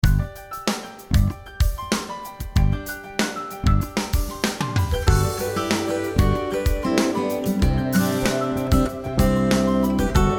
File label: bgm